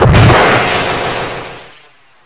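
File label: bone power, necro; Hexen II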